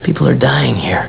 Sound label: dying; lord